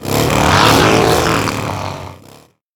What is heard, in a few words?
Hogleader Underlay Attack; Leatherclad Hogleader